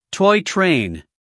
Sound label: toy train